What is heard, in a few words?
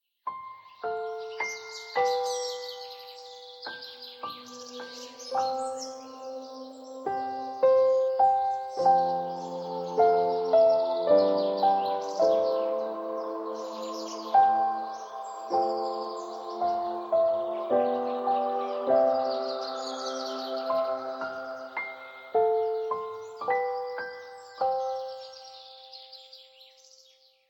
Samsung Galaxy S5